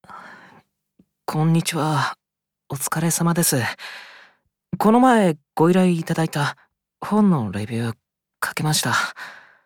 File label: YAY